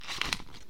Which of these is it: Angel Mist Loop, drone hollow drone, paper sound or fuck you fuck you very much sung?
paper sound